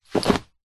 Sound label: clothing denim jeans drop carpet floor belt buckle